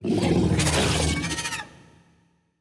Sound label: cutholoids emerge; Stellaris; grand archive; ships